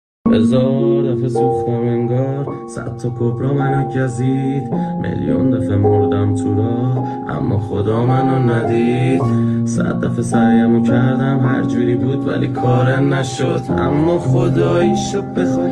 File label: Remix Solo